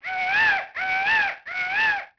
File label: peacock; Ambient; MX vs ATV Unleashed